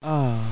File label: ooh